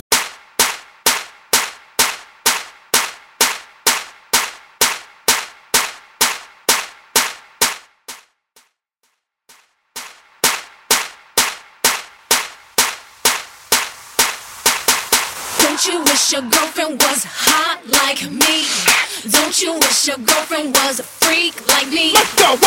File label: EDM Bootleg Clap A Pella; Mainstream VIP